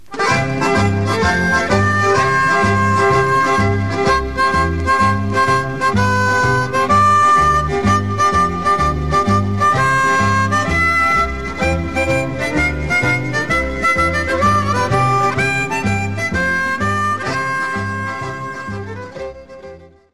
Polka, Etats-Unis

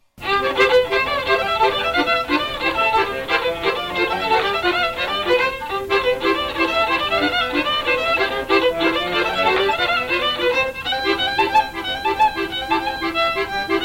Sirba - Roumanie

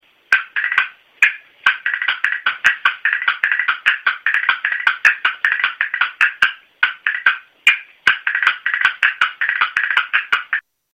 castagnettes; instruments

